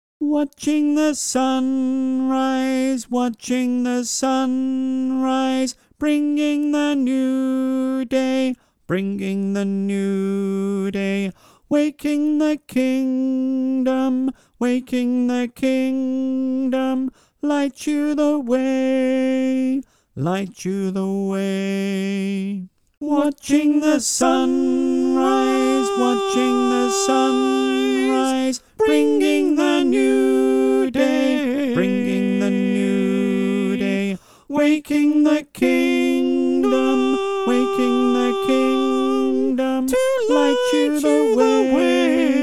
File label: SATB